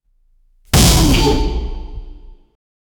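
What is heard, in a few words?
arcade fighting game heavy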